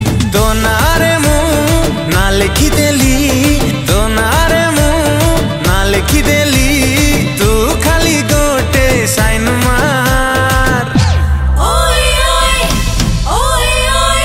Odia Ringtone

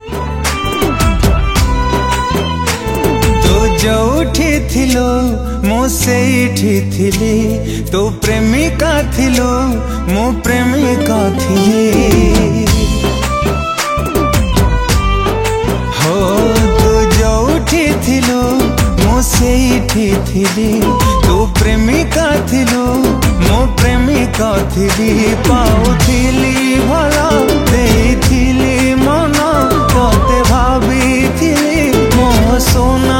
Odia Sad

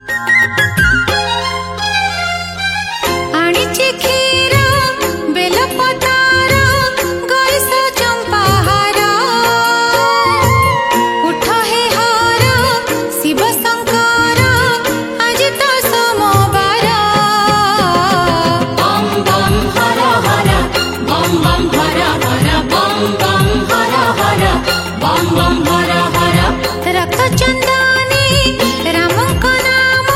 Odia Shiva Bhajan

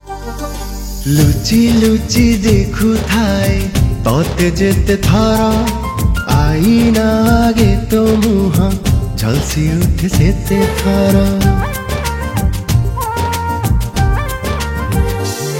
Romantic Song

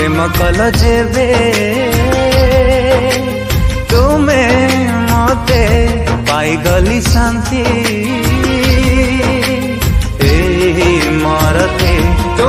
odia christian song

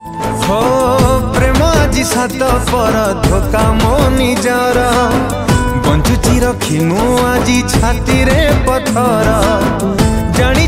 Odia Sad Song

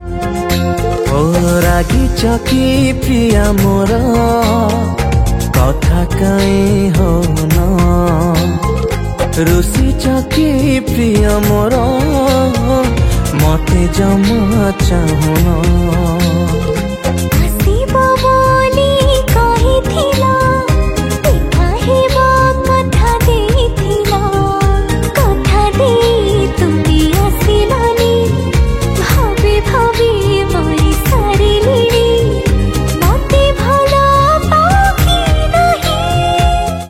Romantic Odia Song

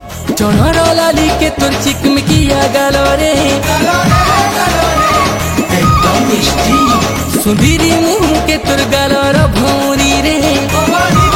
SAMBALPURI SONG

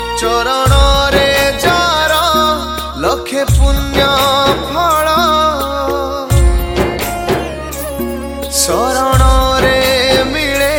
Bhajan, Odia; Jagannath; Mahadipa

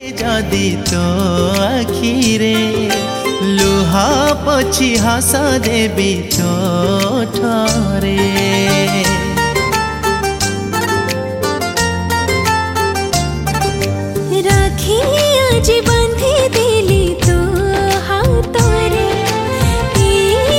New Odia Music Video, Odia Song; Rakhi Special

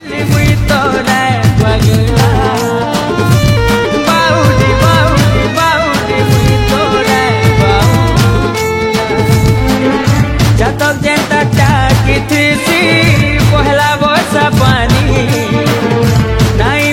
Sambalpuri song

